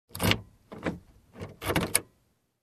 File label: Unlocking Car with key